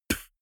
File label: beatbox bass drum dull damped